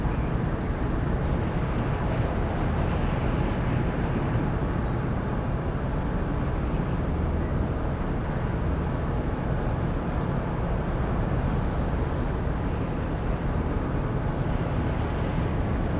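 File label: ambience warehouse district; Pilot Down Behind Enemy Lines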